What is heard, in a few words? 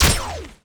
Gun HIT light; Cielonos